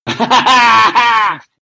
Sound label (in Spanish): risa; Risa Skype